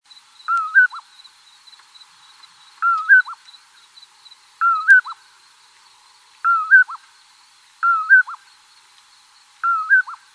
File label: Com Poorwill